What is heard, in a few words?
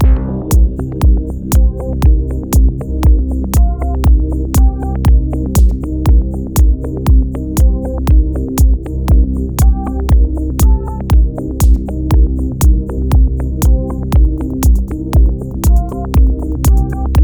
Dark Deep Minimal Dance; CUES for film TV games etc